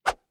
Whoosh Swish Sweep By Long Bamboo Cane High Pitch Fast; Swish Whip Wobble; Staff And Rod; Tom Hutchings